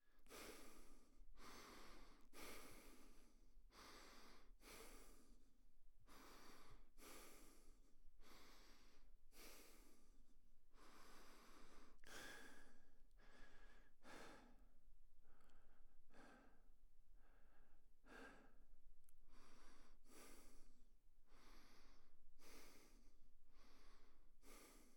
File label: Human Breathing by Nose, Ambient Sounds; Debarup Travel & Films